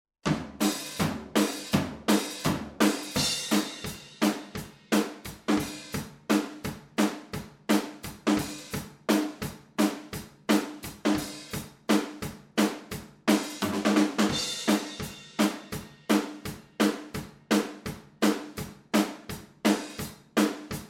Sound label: Drums MONO XY